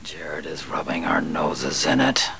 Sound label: rubbing nose